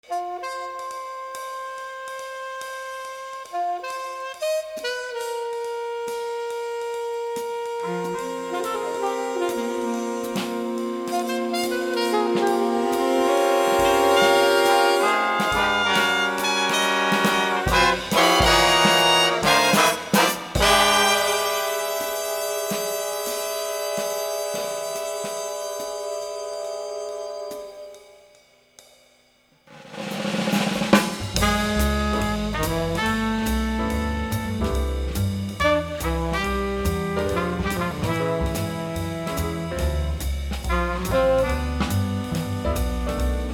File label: jazz